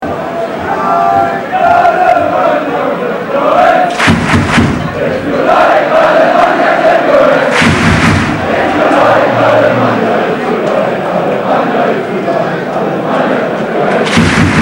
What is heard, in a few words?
Clap Your Hands